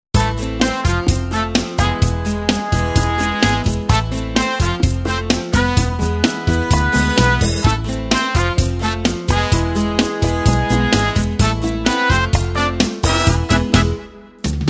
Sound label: Cha-cha